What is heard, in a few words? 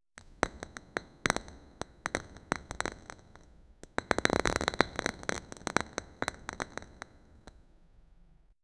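candle flame flicker subtle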